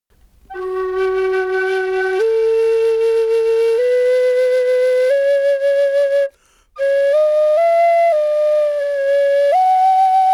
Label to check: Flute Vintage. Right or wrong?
Right